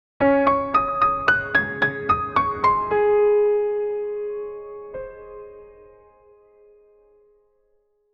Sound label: uplifting piano sound